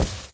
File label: run tile, footsteps